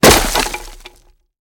Axe Chops Wood Heavy

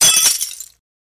Glass Break Generic; windows & mirrors

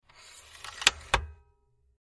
Antenna; Telescoping Antenna Up And Down, Fast.

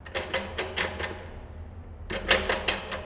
Jail Door Latch Ratt; jail & prison doors

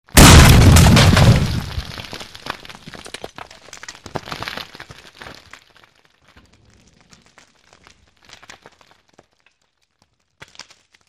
Small Explosion

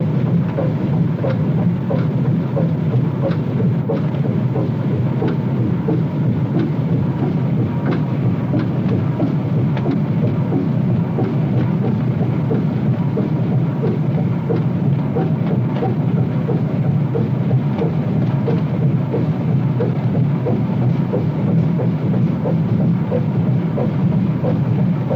Boat Engine Stdy Hum, idle

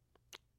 Soft Kisses Soft, kiss

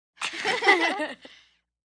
3 Kids Single Giggle

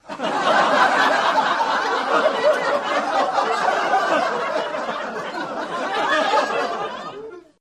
Laughter Lrg Crowd In

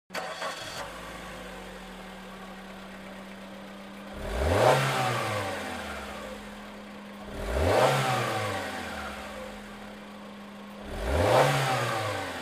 Sm Car Eng Rev Off, compact

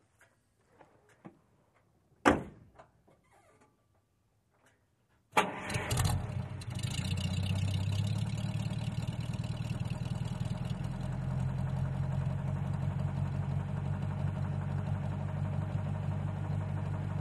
1938 Buick Door

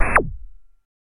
Video Game Fire Effe

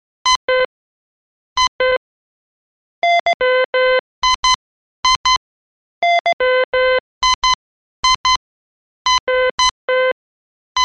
Blips Blips Various

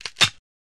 Tree Crackle Break; trees

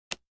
Card Toss On Table; casino games

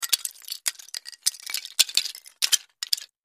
Wood Shims, debris

